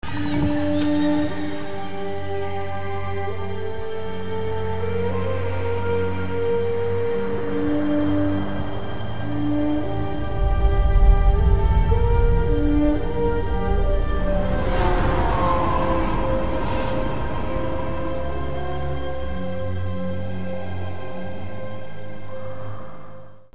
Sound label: music